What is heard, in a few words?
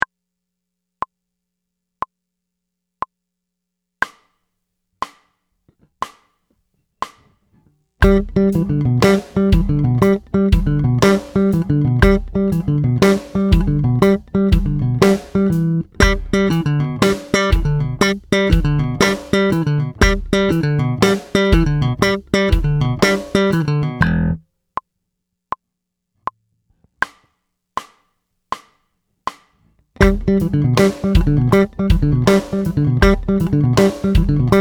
Lick; Killer